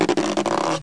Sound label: stretch